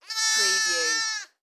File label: Lamb Baa; Sound Effects Bible